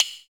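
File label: PER PROCU, Procussion, percussion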